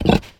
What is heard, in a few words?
pig grunt single; animals; felix blume